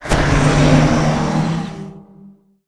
spell magician dark down

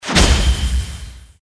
spell power magic arrow shot

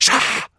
small attack

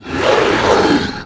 gorilla

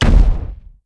walk heavy; left

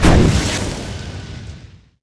spell death blow cast; archer

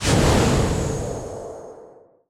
magic hit; 12zi snake boss

